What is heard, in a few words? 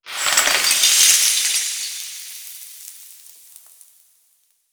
Crystal Dissolve